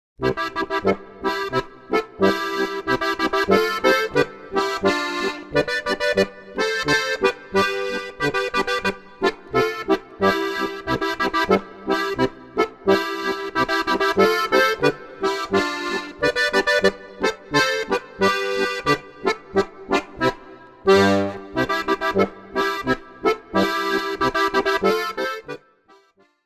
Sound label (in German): Boarisch